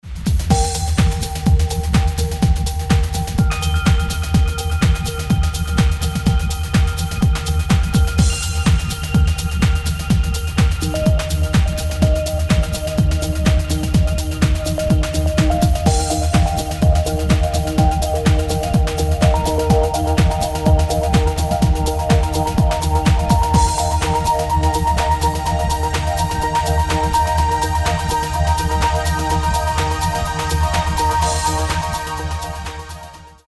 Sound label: Bullet Percussion